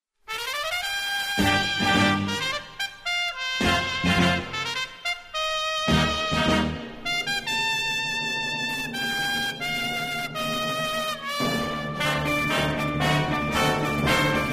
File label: Living Brass